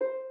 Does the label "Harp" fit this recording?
Yes